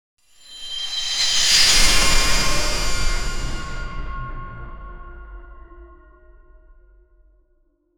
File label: a mystical sword slash; magic